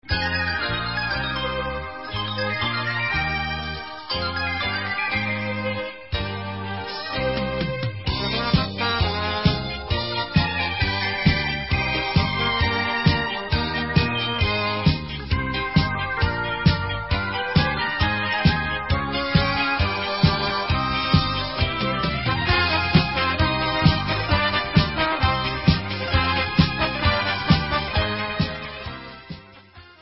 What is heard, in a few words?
Instrumental